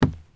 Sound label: sprint wood; Escape from Tarkov